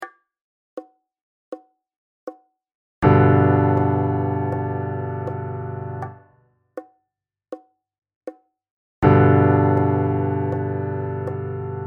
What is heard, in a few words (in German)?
keyboard c dur hoerbeispiel